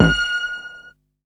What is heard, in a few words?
loop; X-Static Goldmine 3